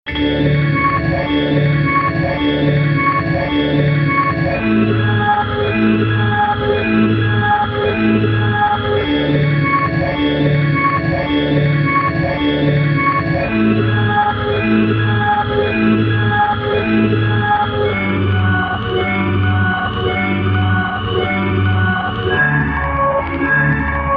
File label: Place (Ambient Version)